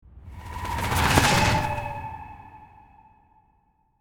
Halloween spooky whoosh transition

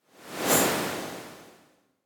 Smooth high frequency whoosh; transition

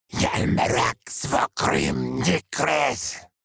Demon beast imp monster spell casting

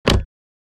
Squeaky wooden door closing; household

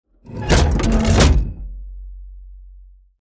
Machine lever pull

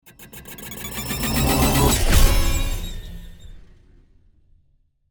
Metal wires transitional impact

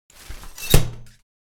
Large umbrella open

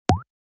Cartoon button

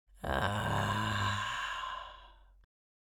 Old lady pleasure moan

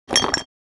Rattle of glass bottles in trash can; household

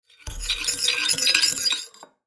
Spoon in glass stirring drink; household

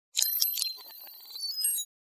Digital hologram interface data loading; Sci-Fi Computer

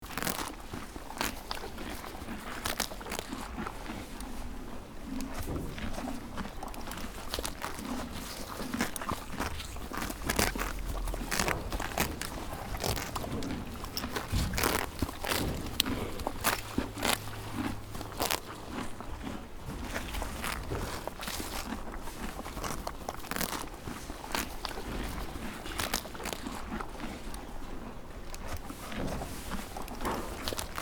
Stable animals eating ambience loop

